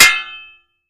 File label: Impact Vari Metal, crashes